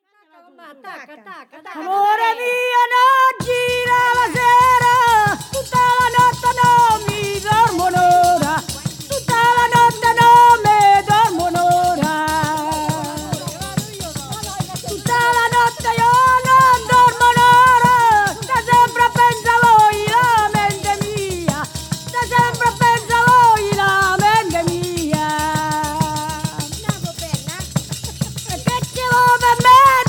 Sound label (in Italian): Amore Mio Stornelli